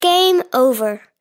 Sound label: game over